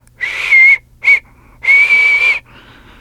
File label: nervous whislte, teengirl; player; survivor; voice